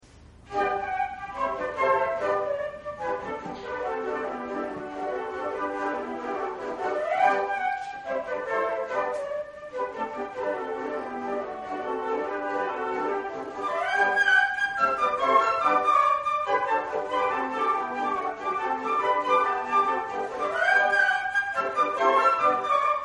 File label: concert, flute